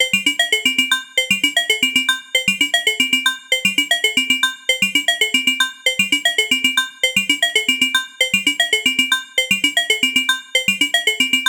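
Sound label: Simple Sequence sines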